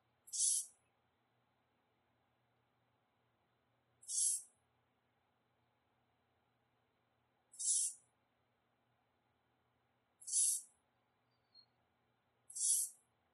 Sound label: fledgling Whet Saw food begging, owl